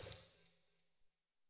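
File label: SYNTH FX; AKAI S-Series CD-ROM Sound Library VOL-5; FX PERC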